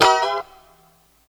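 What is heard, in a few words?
F#4 A mono slide R, guitars; Spectrasonics; Hans Zimmer Guitars VOL-1; 90's Sample CDs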